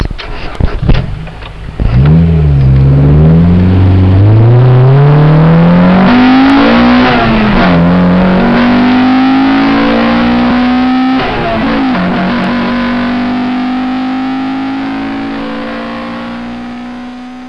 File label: INTEGRA R (drive away), honda